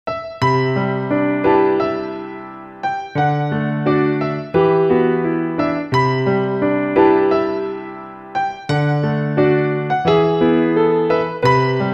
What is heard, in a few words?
With memories -piano-; memories off sorekara privilege original bgm collection 2004